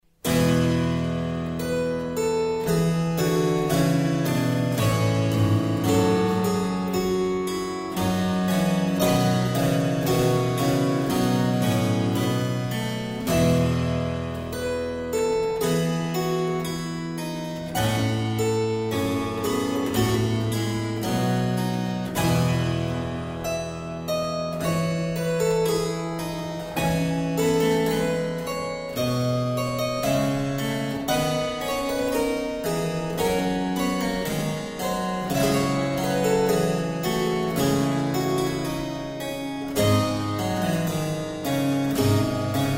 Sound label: keyboard in F major; Ut re mi fa sol la - for keyboard in F major - MB 58